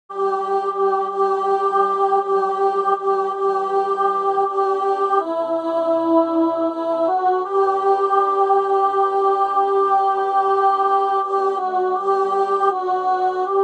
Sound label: Alto